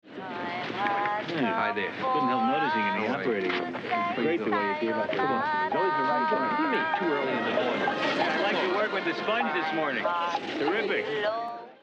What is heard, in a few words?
japanese farewell song; mash pilot